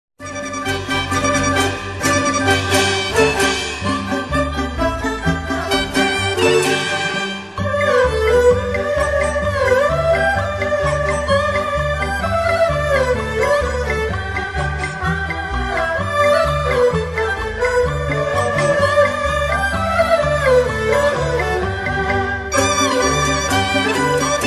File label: bgm loop